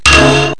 industrial hit

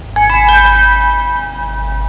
chime; Sound Library 2000; Wayzata Technology

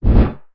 wings

